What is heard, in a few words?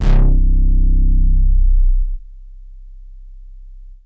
Bass Synths hits and octaves